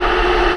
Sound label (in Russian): udar